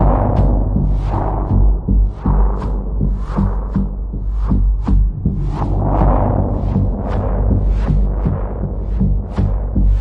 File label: Mysterious Outsider Pulse G, Key of G